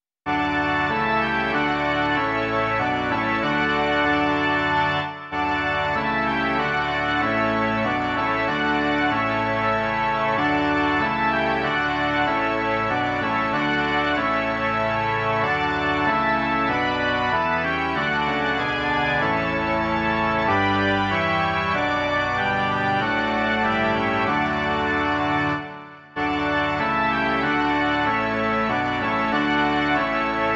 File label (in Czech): varhany; Bože, jenž jsi v nebesích